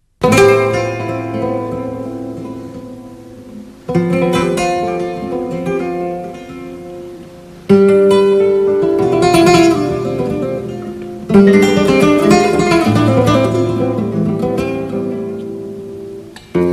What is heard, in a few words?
flamenco